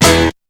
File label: shot; BEAT2000